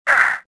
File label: land; swamp